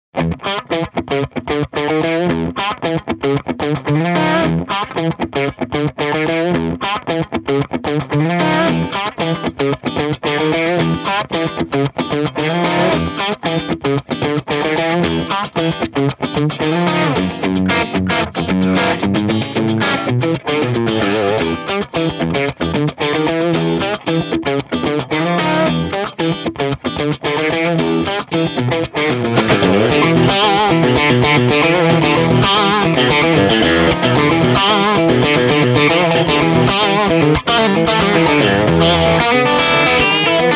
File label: Scorpion midgain; mojave